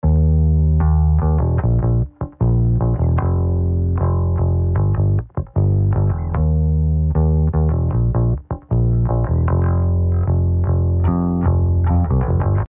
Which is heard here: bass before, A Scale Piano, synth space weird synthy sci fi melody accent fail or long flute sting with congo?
bass before